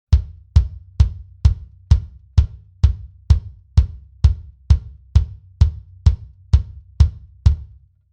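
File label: bass drum Mic Test MD441 solo wet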